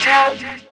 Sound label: Fx Vox; Equipped Music; Loungin House